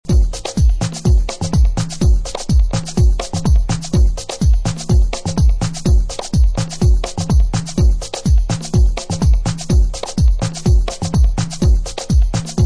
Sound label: slum (drum); House